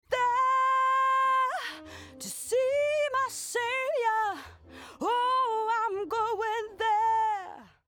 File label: Vox Mix Proc HPF EQ Dyn EQ